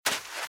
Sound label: Footsteps - Sand - Dry - Run - Left; RadwinIsland